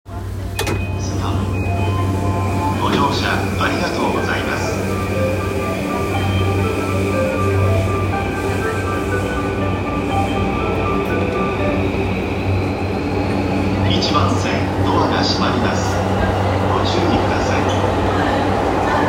JR 山手線 巣鴨 CW, train sounds

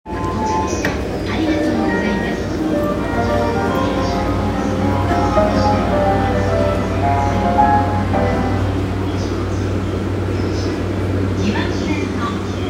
JR山手線田端CCW

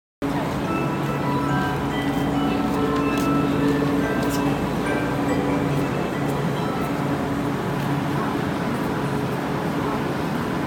新今宮発車, train sounds

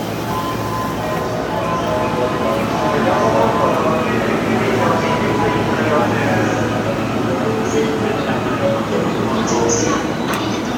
中央線御茶ノ水西, train sounds

